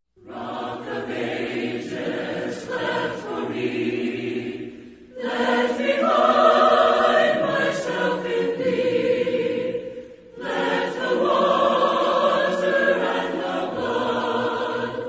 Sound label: a capella hymn; rock of ages